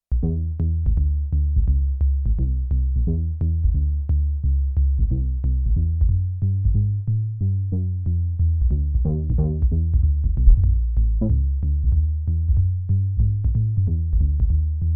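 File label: Upright Bass, Roland JX-8P; UpriteBass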